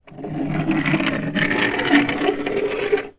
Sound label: toy wooden train roll on wooden surface; zapsplat